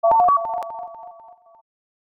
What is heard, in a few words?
normal route jingle